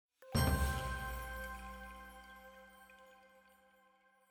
item Jingle Bell chime Perc C

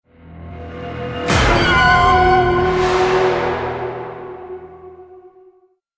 Stinger Swell 5 Seconds

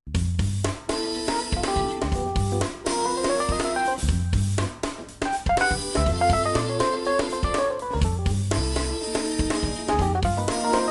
Funk Jazz; Kid Pix 3 Deluxe; Windows

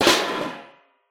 Trash Can Cover

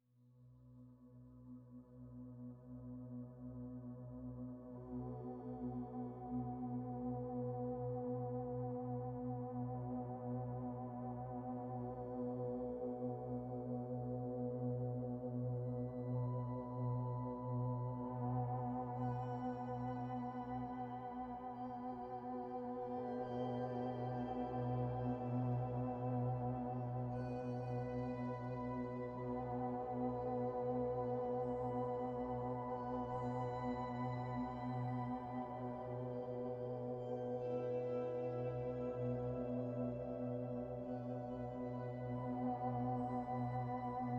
ITATI INTRO AMBIENT; Unravel Two

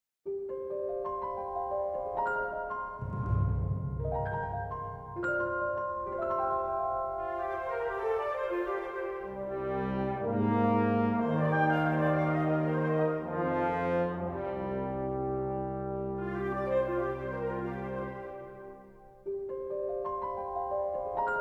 World Music

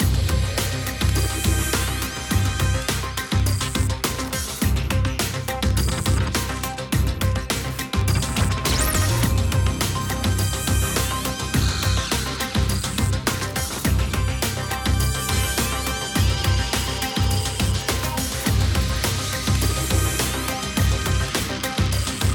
Loading Loop; F1 2015

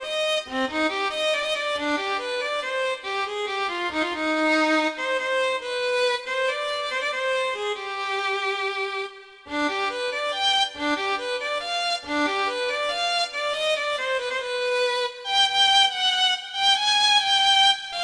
Town Tavern

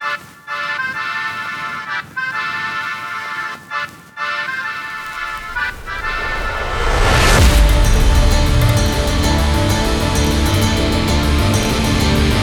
Angry Octopus Instrumental; New York Ocean; Octogeddon

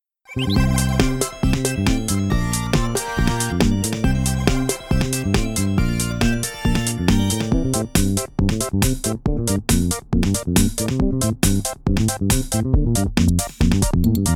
Won Match; Raining Blobs

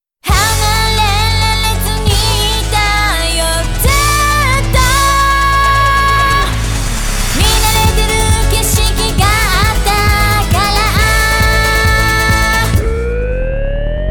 Fly High; Donkey Konga; Japanese version

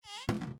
DOOR Cupboard Close; don t be afraid the first toy

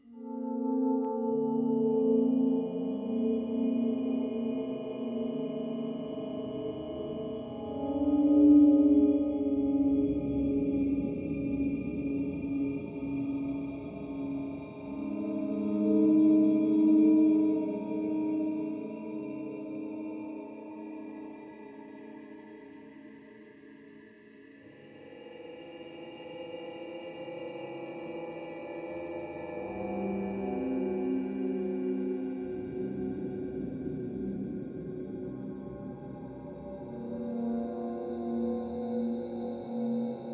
Final Ambience; soma gamerip 2015

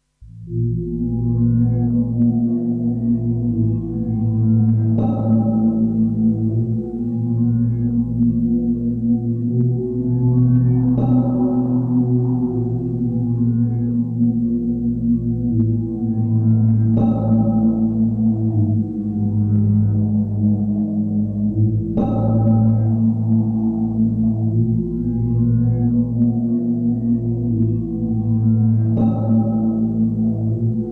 Puzzle; hook memorial sound collection

